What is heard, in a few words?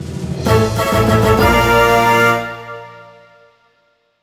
Startup; Cookie Wars (Mobile) (gamerip) (2018)